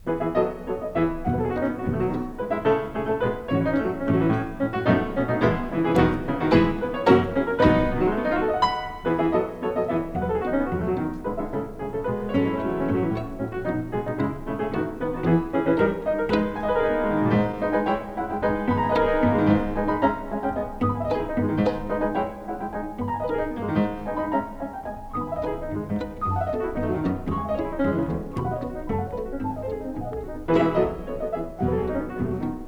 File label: Piano Trio in A Minor, Variation III, Cello